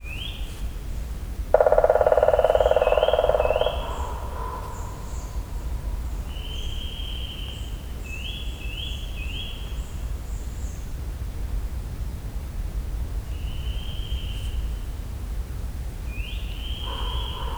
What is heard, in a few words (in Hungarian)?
holló fekete harkály visegrádi hegység; termeszetben